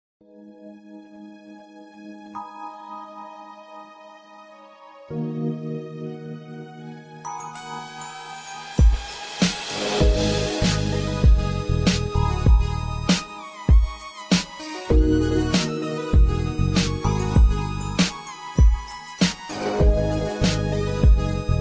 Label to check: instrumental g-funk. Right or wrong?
Right